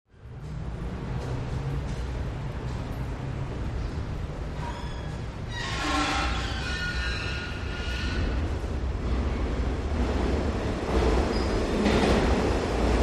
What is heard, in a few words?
Subway Train Stairwe